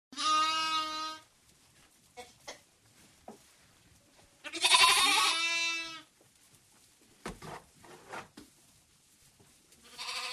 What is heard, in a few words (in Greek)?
Zwa Provatakia